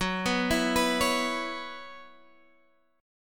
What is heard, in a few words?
B Suspended 2nd Suspended 4th, Gb, chord